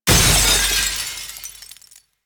Cargo Train Glassbreak Shatter A; Warframe; Corpus Railjack

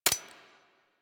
Fire Mech; Warframe; Vipers

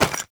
Loot Bag Drop; Objects; Pickups

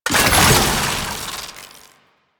Alchemy Grenade Deposit Electricity; Warframe; Entrati

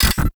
Ability Cooldown Error; Quests; Veilbreaker; Kahl Abilities

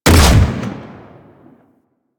Fire Shot; Weapons; Seer; Warframe

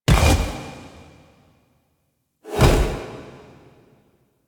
Qorvex Idle Agile Bow; Warframe; Warframes; Idles

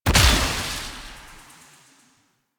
Gas Arrow Explosion, Weapons; Warframe; Infested; Derelict Cernos Prime

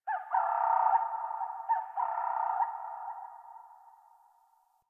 Birds Medium, Ambience, Plains, Landscape; One Offs; Warframe; Eidolon Plains

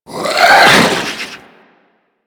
Head Shake; Warframe; Stalker

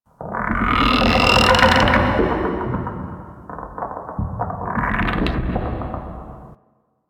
Tentacle Creak B; Ambience; Interior; Warframe; Orokin

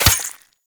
Melee Dagger Impact Flesh; Weapons; Impacts; Daggers

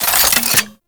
Fulmin Prime Primary Switch, Weapons; Warframe

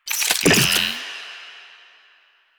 Reload; Warframe; Akarius Prime

